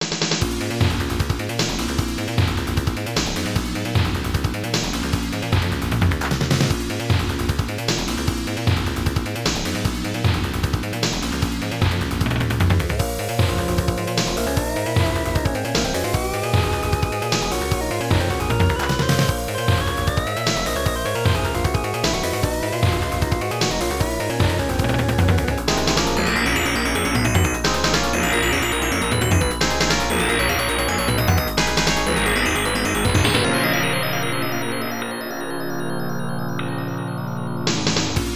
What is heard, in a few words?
RED ALERT; Bosconian; X68000